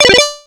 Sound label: Coin; SilentDragon